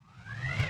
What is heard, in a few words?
train arrives; netent